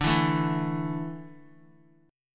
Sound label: D Suspended 2nd D down Guitar, chord; strummed